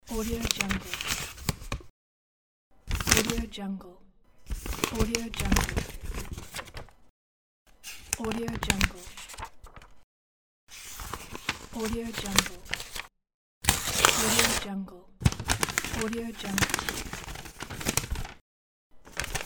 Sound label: paper